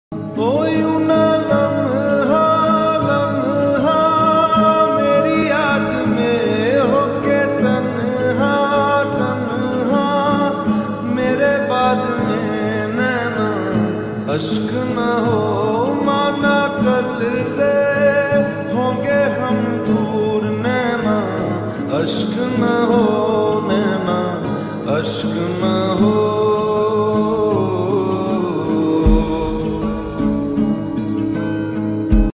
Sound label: slowed reverbed lofi ringtone; independence special; ghostwarbgm